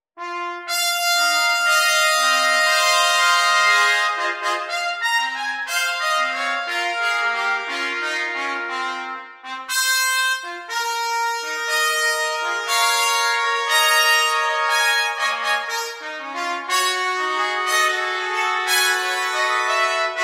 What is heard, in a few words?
Fanfare for Four Trumpets; berniescherrcomposer